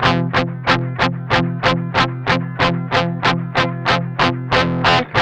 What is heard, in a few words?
MED ROCK 8TH F#3, Guitars; Spectrasonics; Hans Zimmer Guitars VOL-1; 102-MED ROCK